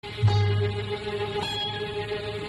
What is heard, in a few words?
Instrumental